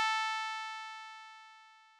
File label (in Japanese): 調波構造音（10～30本） 高域, 仮想ピッチ; 音の高さ; 高域下がり・時間減衰波